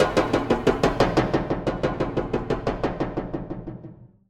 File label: 90 bpm beats